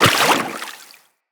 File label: Walk Water, Footsteps; Operator